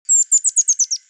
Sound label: rufous tailed hummingbird